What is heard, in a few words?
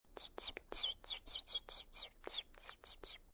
Animal sounds, bird, light chirping (human voice); Birds; VoiceBox